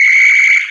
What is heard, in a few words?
Horn; MK8; Mario